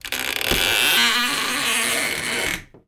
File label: opening old wooden door